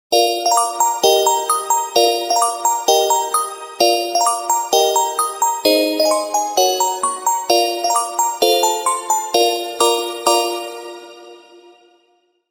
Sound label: Happy holiday music ringtone